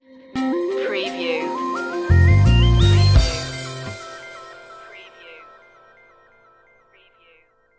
lounge isle stinger

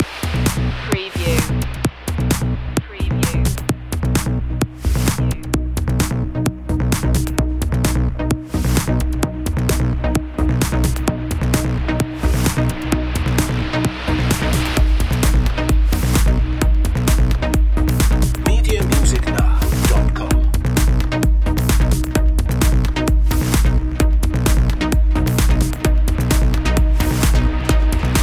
summer trance loop

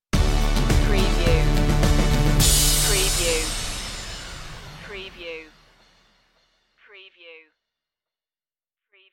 second sun stinger

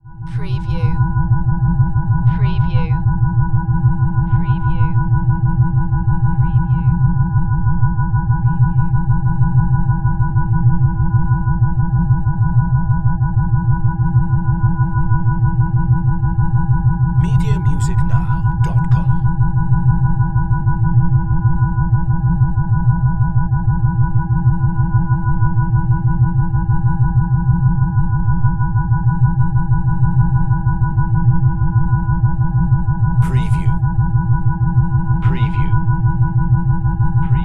Alien Power Drone; sound effects bible

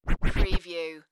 Turntable Scratching

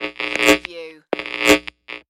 Electric Crackle Swell Multiple

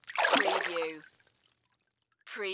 Splash Bath Tub

